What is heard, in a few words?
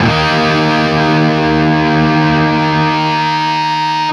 5TH D#2, Guitar; 5THS; East West; Steve Stevens Guitar Samples Collection